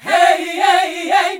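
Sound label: Gospel; Best Service Gigapack 1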